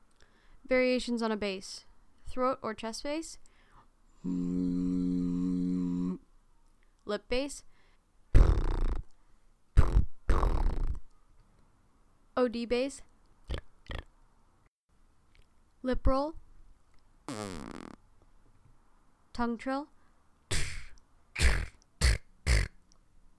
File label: Basses